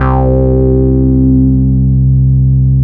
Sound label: Dance Bass